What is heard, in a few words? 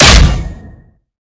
take head damage; Valorant